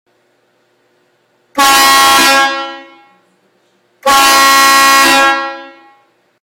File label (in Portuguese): buzina trem; araponga